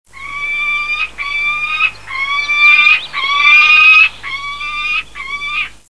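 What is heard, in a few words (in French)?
faucon pelerin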